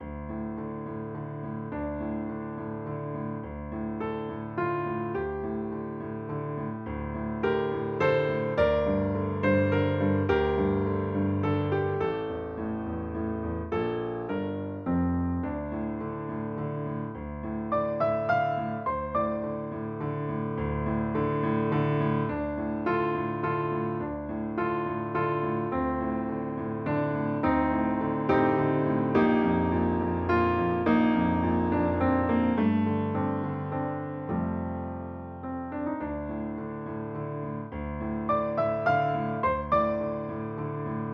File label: Sad Piano Song